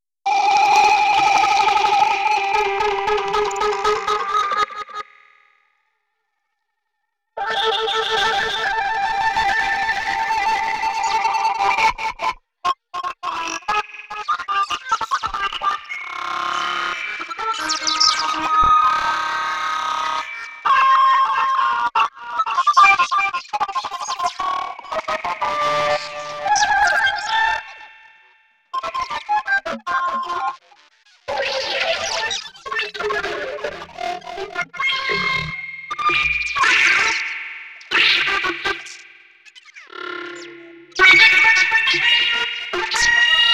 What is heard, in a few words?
Flute Bus; KIBO; KIBO Interferometer stems